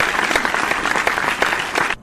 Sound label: clapping